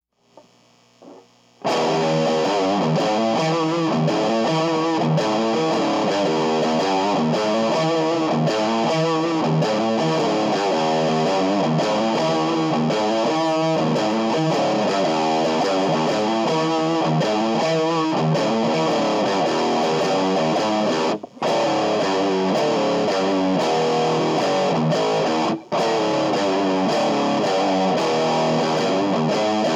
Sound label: Riff II